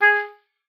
oboe, G#4; Xsample VOL-6

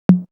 Tom, Drum One Shots; AMG - Coldcut's Kleptomania VOL-1

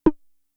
Tom, Toms; Fricke Schlagzwerg; Drum Machines

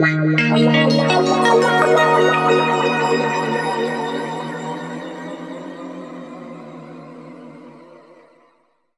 D# P-S WA -L, Guitar Samples; East West; Steve Stevens Guitar Samples Collection; PTCH-SHFT+WA

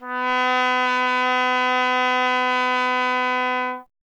TP B; Miroslav Vitous - Solo Instruments VOL-1; Trumpet

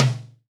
Hip-Hop Kit Big Tom; Roland Juno-D

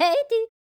YODEL, World Voices; ILIO; Vocal Planet

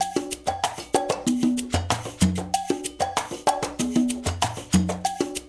LOOP, Percussion, FUNK

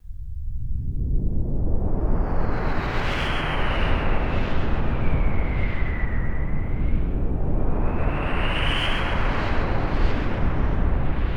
METEOR; Best Service; Advanced Mega Effects

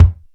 R-8 trample k, Dry

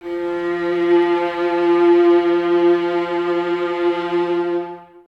violas F 3, String Ensembles; Miroslav Vitous; VLS 1+2

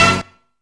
HIT, HITS+STABS; Zero-G

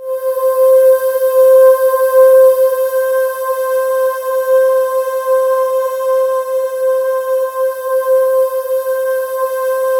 C5, Synth Leads

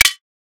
claps, dance drum kit; 99Sounds x Monosounds; Twilight

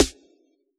SD T8; Yamaha DJX; DJX Kit

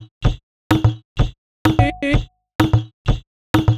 Forward Top B 127bpm, House Drumloops; 90 s Sample CDs; Zero-G House Fabrik Drumloops; ZG Drumloops

